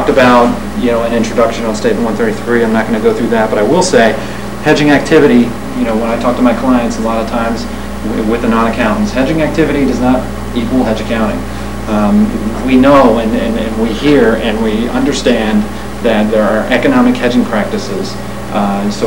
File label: WOODS